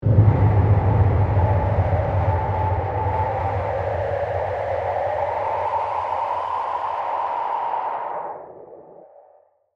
Hit Fuzz Swell, Hit, Fuzz, Swell, Horror, Accent, Transition; Production Element, Imaging Element; BlastWave